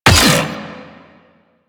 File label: Drone Weapon Fire; Warframe; Corpus; Corpus Lich